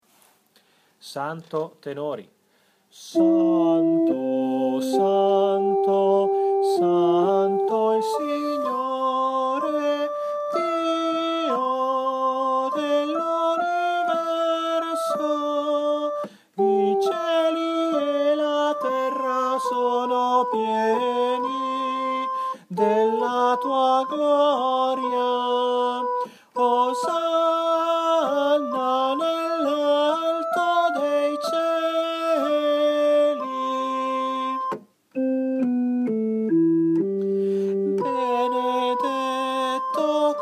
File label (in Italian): tenori; santo